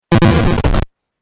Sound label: Retro Glitch; Glitch Sound Effects; sound design